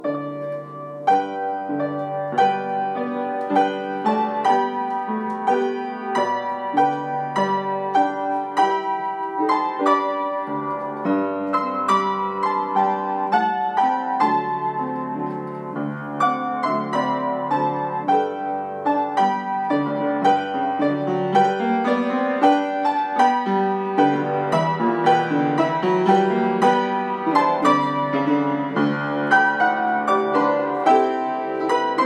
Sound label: Andantino giocoso in G major, piano